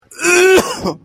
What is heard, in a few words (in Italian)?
tosse